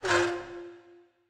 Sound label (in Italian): Libreria; BLIND QUEST - The Enchanted Castle